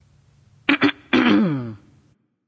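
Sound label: clear throat, female; smite; voicepack; hera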